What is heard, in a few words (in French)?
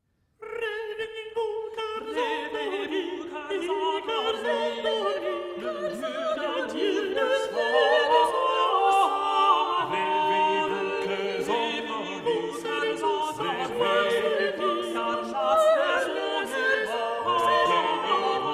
Le chant des oiseaux; Clément Janequin; 1485-1558